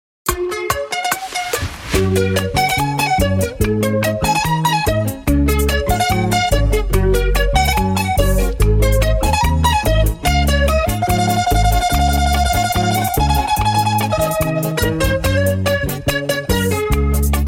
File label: Bachata Classica